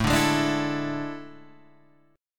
A Major 7th Suspended 4th A down Guitar Standard, AM7sus4 chord; strummed; JGuitar